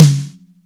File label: SNARE, DRY; Crypton - DrumBase5000; DRY KITS